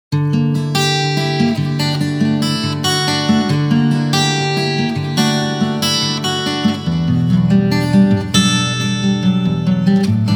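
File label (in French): guitar chorus, guitare acoustique, delay multiptap; la spacialisation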